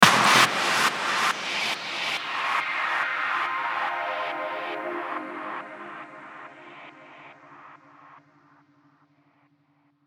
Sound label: Trance Sweeps; VEC2